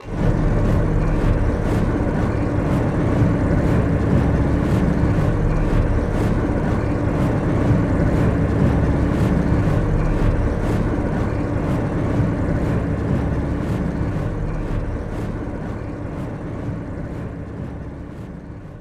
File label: Rotisserie Fast; Scraprun; Deathrun